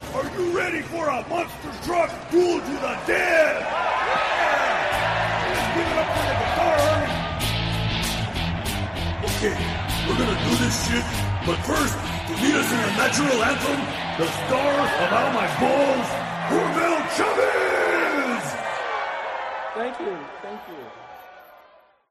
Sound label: guitar army intro; idiocracy